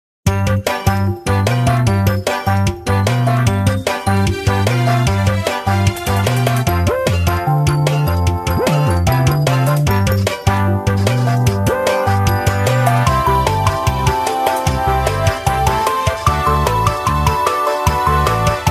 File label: Board Start Theme